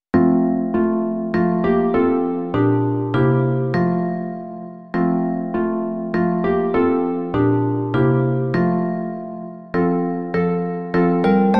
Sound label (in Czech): harfa a vibrafon; Bohoslužba začíná; Imaginativní verze